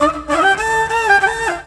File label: World Lick